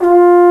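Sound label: Trombone p F2; Digital Sound Factory Virtuoso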